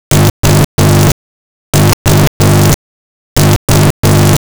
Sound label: REVERSE SILENCE